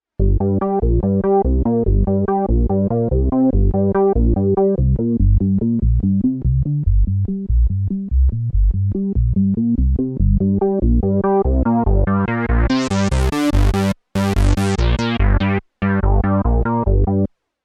Organ II

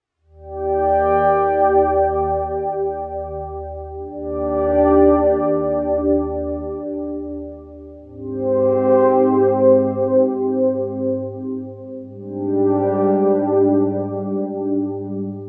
Sweet Pad

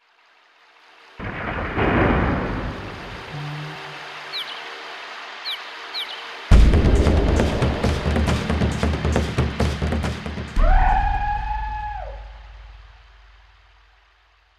Africa; SPD 20

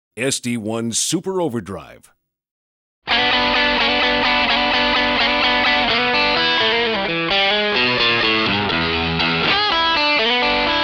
tonedemo

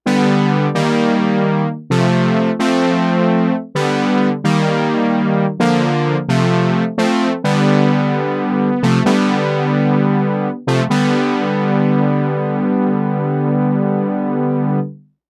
Lo Brass

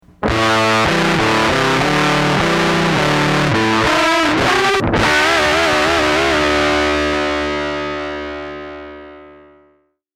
big muff

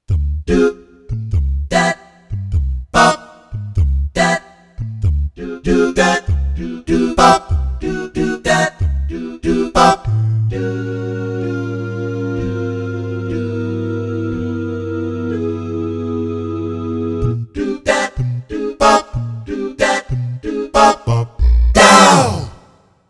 jazz scat

